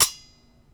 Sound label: Spring Loaded Blade; bb - Smol Mechanisms